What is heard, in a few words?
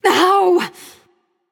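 ouch, female; smite; voicepack; hera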